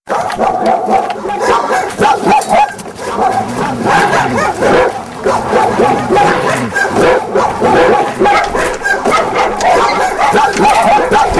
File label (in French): chiens